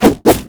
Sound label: bear basicattack; LoL; annie base